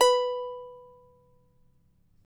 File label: charango B3, guitars; Spectrasonics; Hans Zimmer Guitars VOL-2